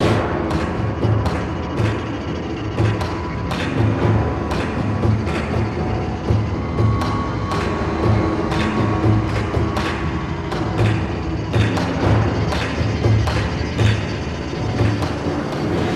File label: PERC INTRO LP; transformers war for cybertron gamerip; ZETA LAST STAND